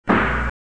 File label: slow drum